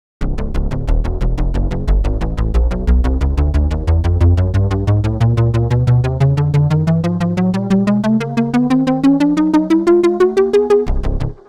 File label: ascending seq; the analog sound waves